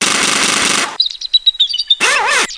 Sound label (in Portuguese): picapau; GEOGRAFIA